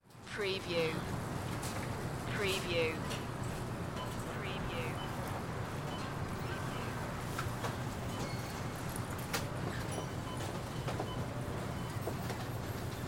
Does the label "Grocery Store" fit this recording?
Yes